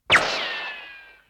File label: ricochet